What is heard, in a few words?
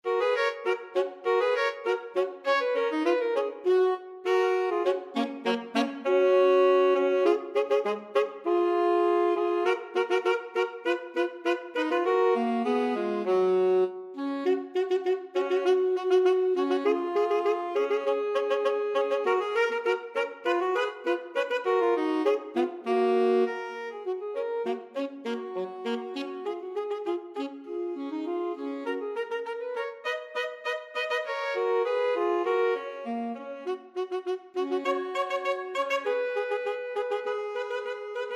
alto sax tenor sax; grand old duke